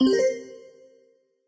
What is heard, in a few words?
item finder; pokemon reborn e19 2022